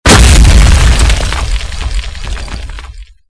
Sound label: cannon 30 ap hit